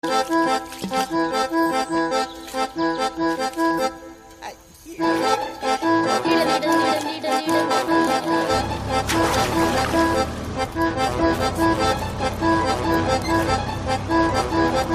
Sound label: malayalam tune